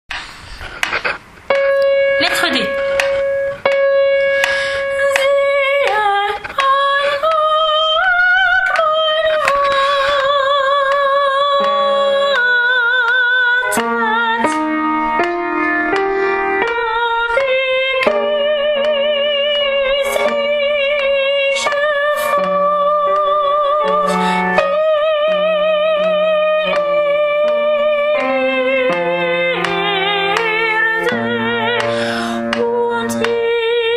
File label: soprano; Brahms requiem allemand; lettre D